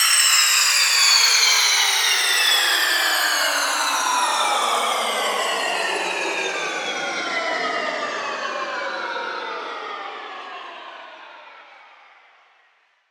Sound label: SFX Down, Dubstep; Ghosthack; Dubstep Sound Arsenal